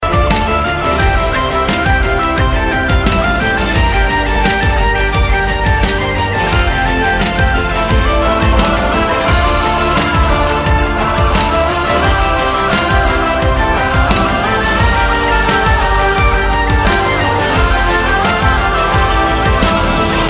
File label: Celtic Magic